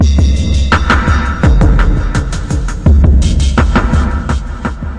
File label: 084 cubists rastabreak